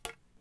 Staff Tap; Civ4 warlords

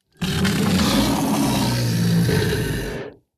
ambient port; World Of Warships; port3d

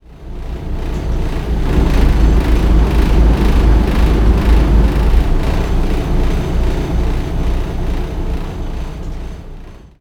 ambient battle; World Of Warships

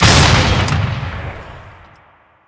flak gun 1 shot

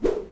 Swing Cable; Civ4 warlords

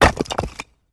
building fall small

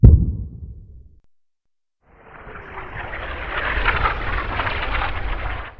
Artillery; Red Orchestra Ostfront 41-45

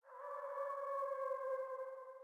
sting wolf; Rust

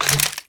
grenade launcher pickup ammo

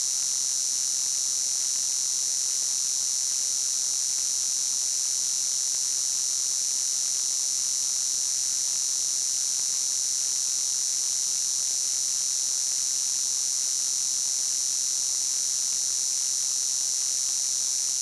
ambience electric buzz constant; Rust

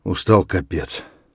tired, voice; Escape from Tarkov

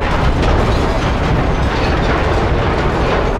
damage vehicle; World Of Warships

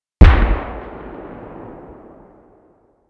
Vehicle Weapons; Red Orchestra Ostfront 41-45

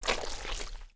Foot Mud; Civ4 beyond sword

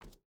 footstep barefoot wood walk; Rust

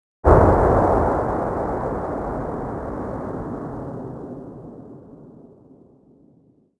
expl far tail, explosion; World of Tanks Blitz

